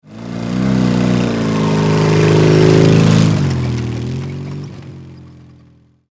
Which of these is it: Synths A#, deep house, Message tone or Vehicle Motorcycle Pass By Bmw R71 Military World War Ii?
Vehicle Motorcycle Pass By Bmw R71 Military World War Ii